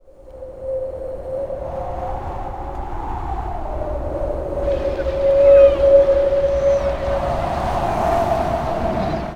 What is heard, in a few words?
WIND